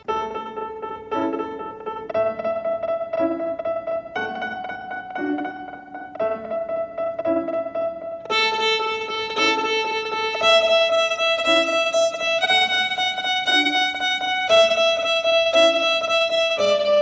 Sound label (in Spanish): Twinkle Ritmo E Normal Lento